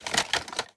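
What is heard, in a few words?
inspect movement; Valorant; cstrike; operator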